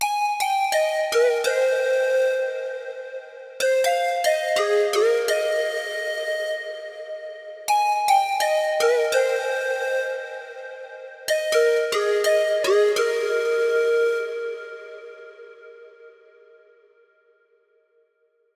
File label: F what did you say lead